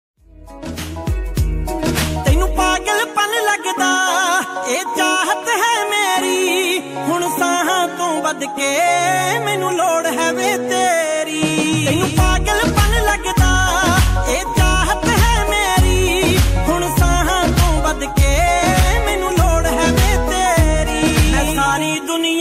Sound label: punjabi sad ringtone